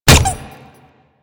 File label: Punch Impact; Royal Jester; Attack